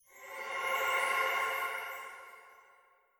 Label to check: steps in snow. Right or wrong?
Wrong